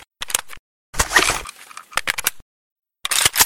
ak 74 reload full; eft

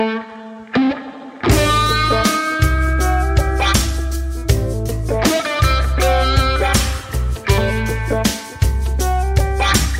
romantic sound

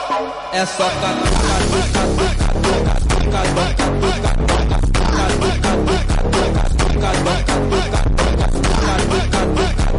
phonk brazil

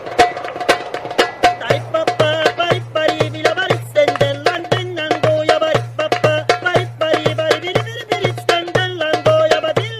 cat vibing to ievan polkka

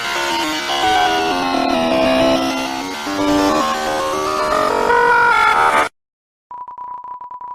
glitching; gaster; undertale

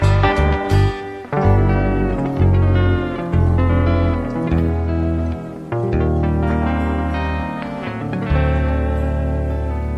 disappointment music; spongebob

